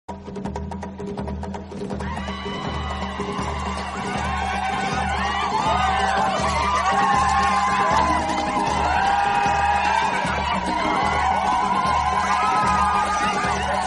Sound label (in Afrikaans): afrikanse toon ringtone